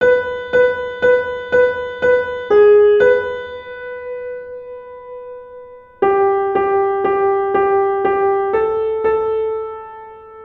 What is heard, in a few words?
Melody